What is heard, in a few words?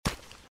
giant rat foot; npc